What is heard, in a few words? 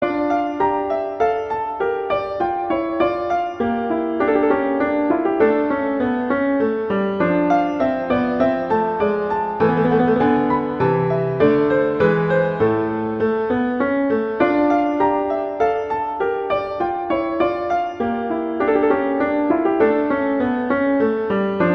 Menuet; Bach; French Suites